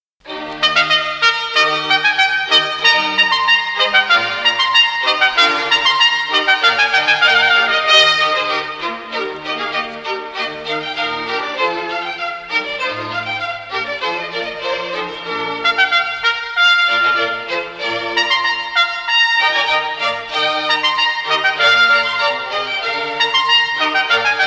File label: SONATA FOR TRUMPET AND STRINGS